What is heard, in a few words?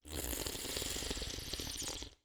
Slurp; Babushka